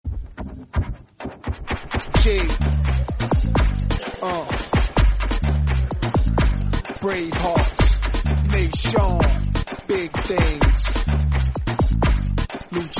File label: marathi mix tone